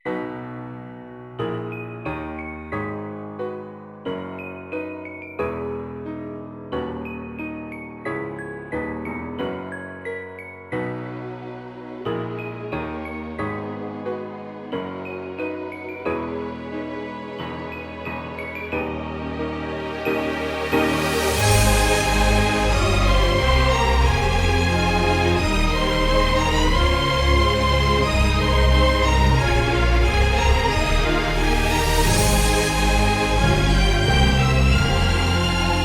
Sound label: no vocals